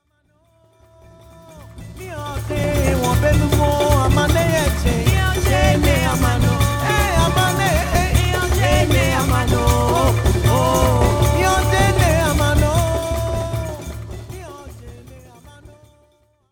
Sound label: kolomashi song with piano